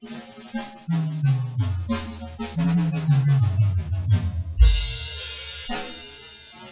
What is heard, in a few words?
Drum Solo